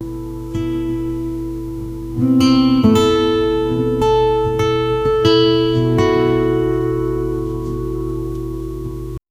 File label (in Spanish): guitarra tocando algo